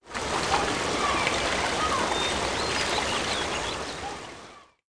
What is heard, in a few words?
FOUNTAIN, buildings